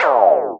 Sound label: Exit Game Sound